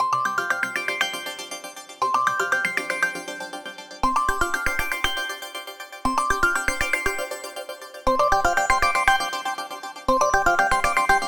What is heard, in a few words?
Radio Wave, ringtones; Samsung; Galaxy A56